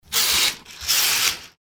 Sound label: curtains closing